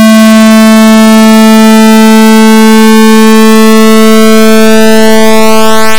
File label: duty cycle example